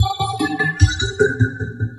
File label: Vocoded Percussion; Vocal; Sonic Foundry (Sony Creative Software); Myths of Technology